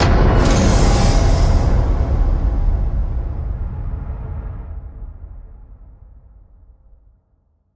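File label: hit horror; Released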